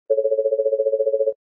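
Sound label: ishodyashiy zvonok, outgoing call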